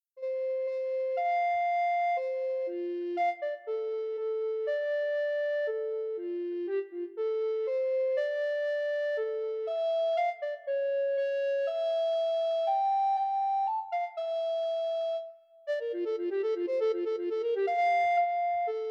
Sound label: Three Sententiae for Alto Recorder